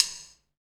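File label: SD, Roland, snare drum; 90 s Sample CDs; Sound & Vision - Gigapack I CD 1 (Roland); Real-Kit s 6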